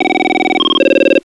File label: ring pattern